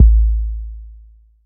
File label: solid kick single hit a key; kick drum samples